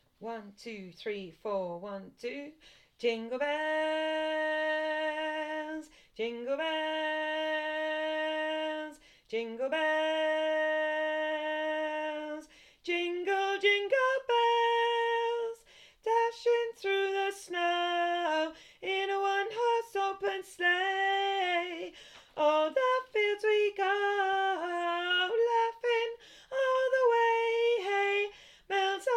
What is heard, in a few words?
BARITONE; JINGLE BELLS